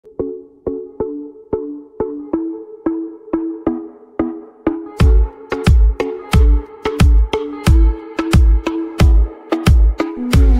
english instrumental ringtone instrumental ringtone only musical ringtone